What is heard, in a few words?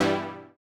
Housey Stab G2; KG MegaSaur